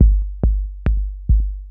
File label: KICK TECHNO 140, Techno Drum; Techno2